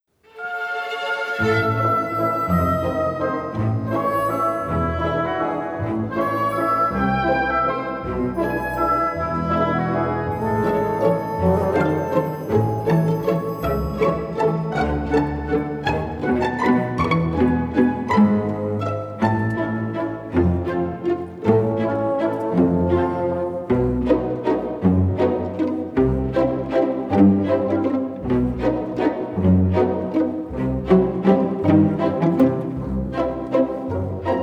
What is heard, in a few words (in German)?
Baby Waltzer